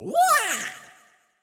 Rock uppercut Scream

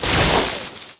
Bricks row clear

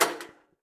Door Chrono Close

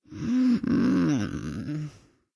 Disco Sleep; Cut the Rope: Time Travel

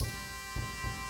Music Main Amorce 108bpm; Act Brittany

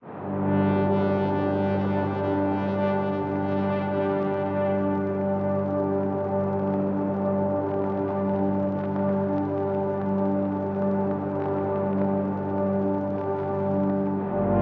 City Ruins Stem; Epitasis

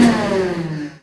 Servo Medium Stop; Puddle; puddle plus ios gamerip 2018

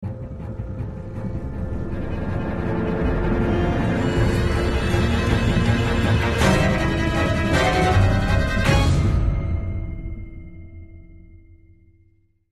Tense; The Walking Dead: The Telltale Series

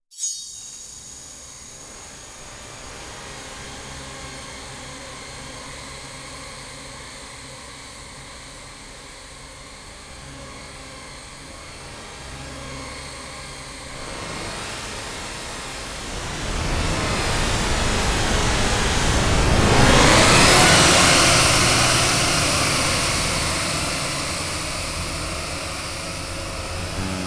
Horror Noise; Inmost

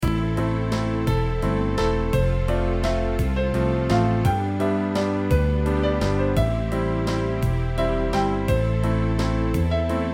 Waltz

